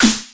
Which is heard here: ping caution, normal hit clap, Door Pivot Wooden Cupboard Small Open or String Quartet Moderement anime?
normal hit clap